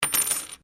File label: Coin Penny Single Drop Wood; Common coins; Money Sound Effects